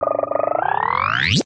attack; dexomon2

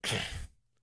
nervous; burger time

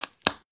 place match; draw pixels

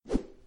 fire; flying ball

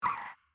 brake; traffic command

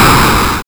explosion; avoid the spikes

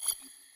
menu swipe; steam camp

